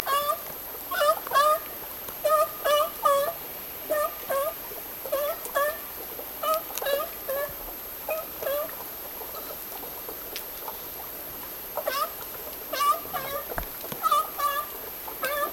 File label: harbor seal; biophony